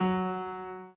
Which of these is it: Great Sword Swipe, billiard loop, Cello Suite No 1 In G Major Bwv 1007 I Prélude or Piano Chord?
Piano Chord